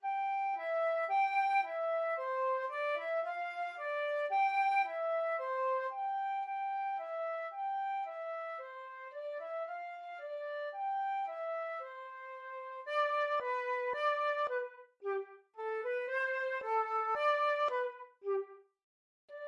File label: oranges and lemons, flute